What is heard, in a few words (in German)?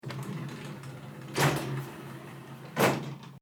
Duschkabine auf zu